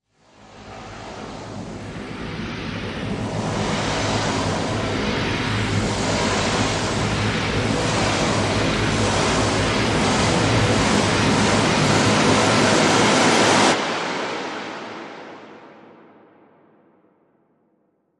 Rise Long

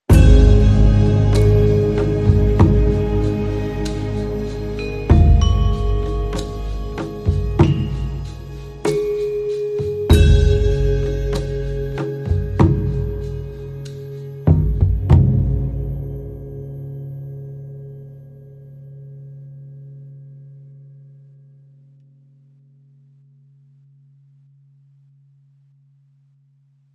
Sting No Lead

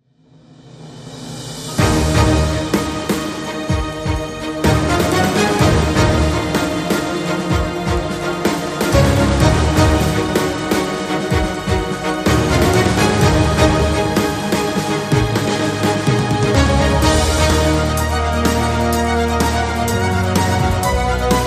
No Choir No Electric Guitar

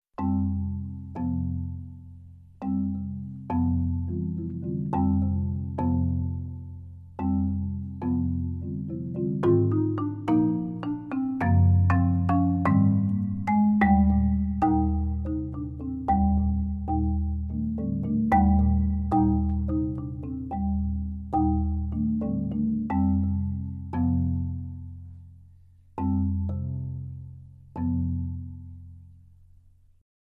Marimba Flowers, Solo

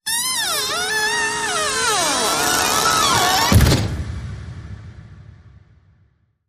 Balloon Pop Hit; Say Cheese

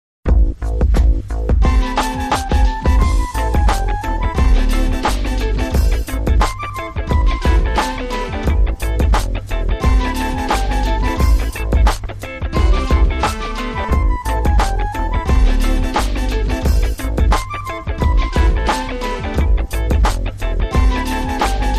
70s Funk

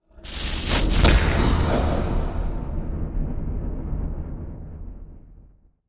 Course Rumble And Hard Impact Sweep

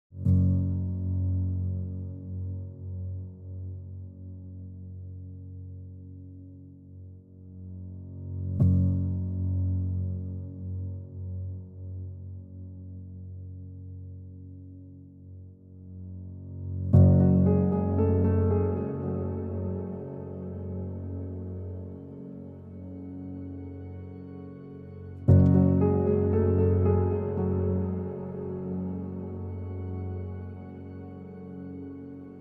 Ambient

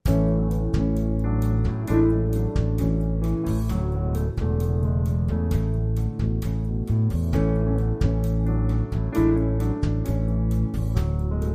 With Trio

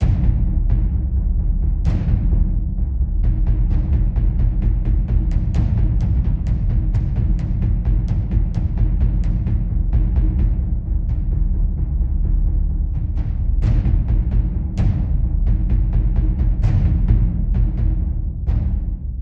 Loop Layer Deep Drums

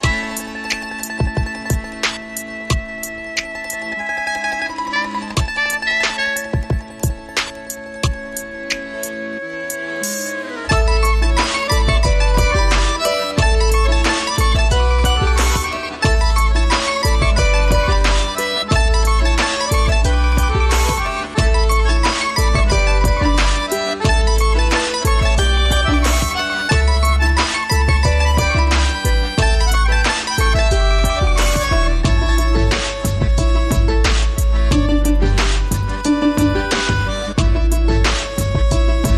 Mandolin Groove

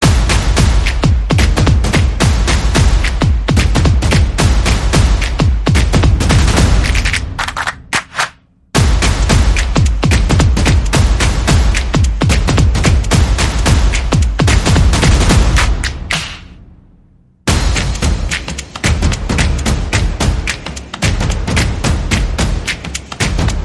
percussion and effects

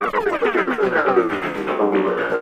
Shake Down Transition

